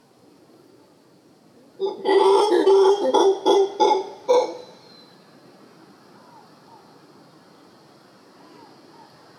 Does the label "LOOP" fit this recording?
No